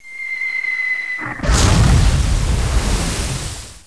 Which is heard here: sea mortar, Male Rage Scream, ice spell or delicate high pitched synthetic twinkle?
sea mortar